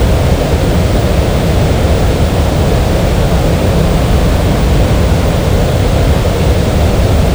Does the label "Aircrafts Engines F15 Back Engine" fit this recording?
Yes